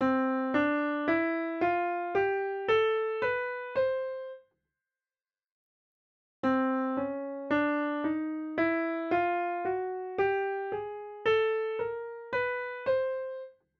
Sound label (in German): tonleiter zwoelfstufig